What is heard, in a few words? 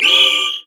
whistle; renekton skin06